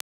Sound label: Filter A, Weighting Filters